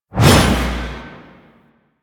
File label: Backstab Throw; Enemies; DE; Warframe; Warframes; Pax Duviricus